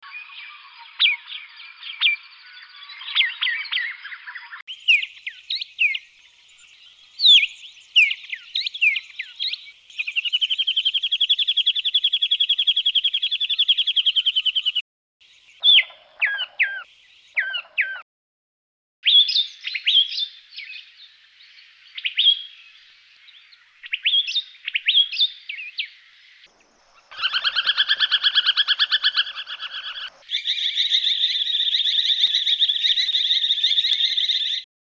white Plumed, honeyeaters

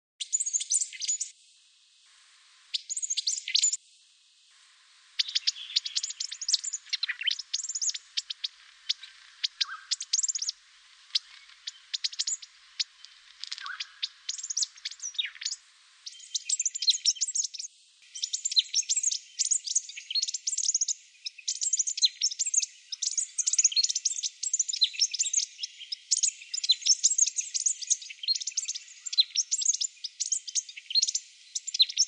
buff Rumped Thornbill; australasian warblers; canberra garden birds

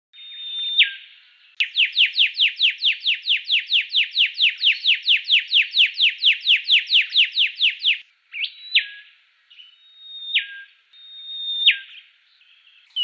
rufous Whistler; whistlers shrike thrush; canberra garden birds

